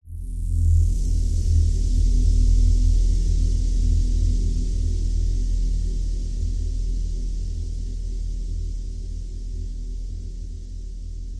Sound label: horror swell dark eerie cold desolate airy; zapsplat